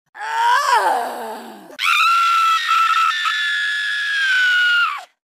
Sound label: extreme boost k o scream; mk9 mileena